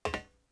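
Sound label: metal walk, footsteps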